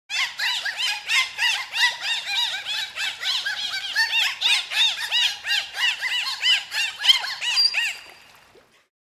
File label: Actual bottlenose dolphins, Two bottlenose dolphins